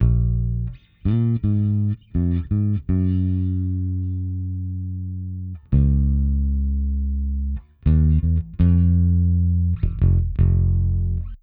Bass Hip-Hop

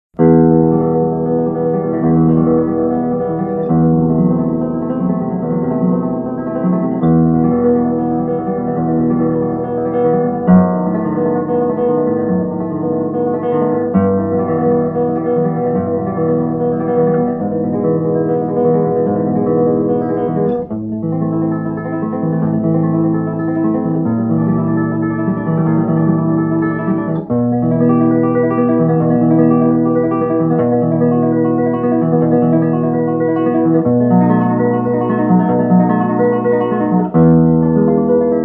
Classical Guitar; The Guitar, Solo; Etude No. 1

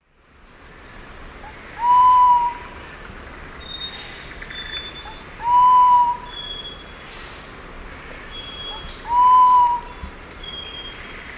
Geotrygon saphirina, song; S. America; Eastern Lowlands

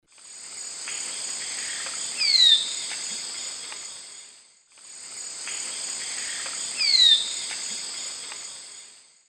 Pipra filicauda, call or song; S. America; Eastern Lowlands

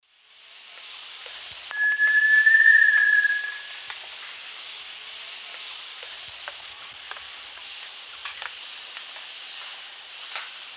Crypturellus soui, call; S. America; Eastern Lowlands

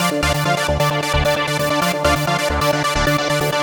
Lead Synth; Dance; Synths Lead

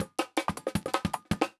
rumble

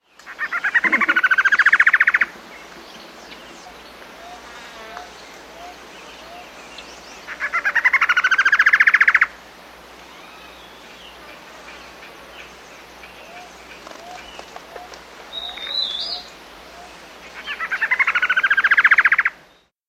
Pectoral Antwren, S. America

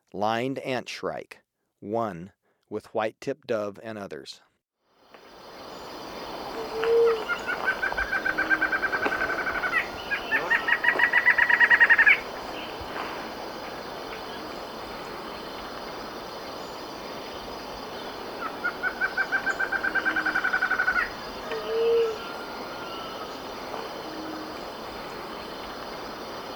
Lined Antshrike; S. America

